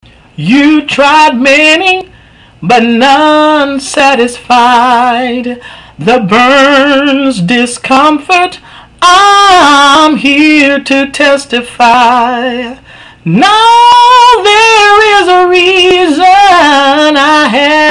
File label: Jingle